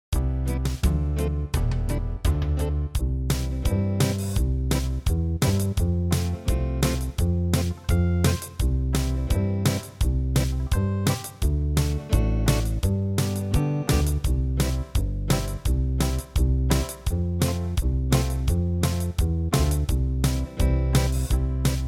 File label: blues slow, fiddle; east tennessee